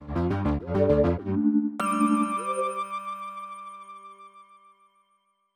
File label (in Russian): сбивка; audiobrending dlya podcasta